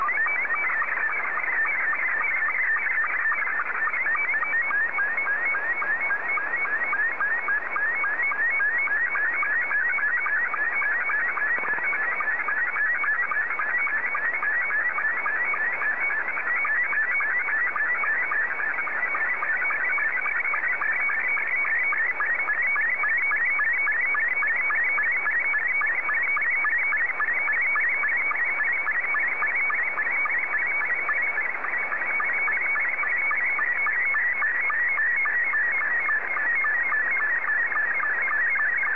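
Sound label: SSTV, Scottie 2; JA3QJQ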